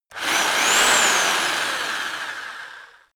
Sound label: rock drill rev reverse double; maschinen; bohrer